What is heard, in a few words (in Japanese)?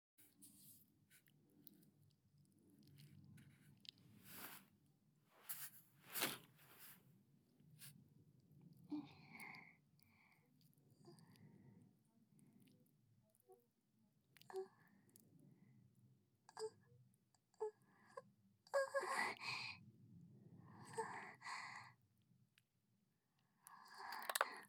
おなにーボイス